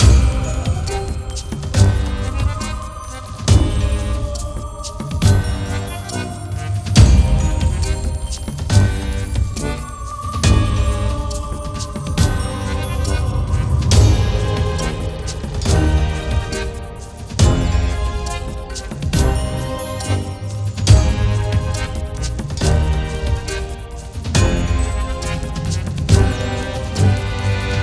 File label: Idle LOOP; RatWorld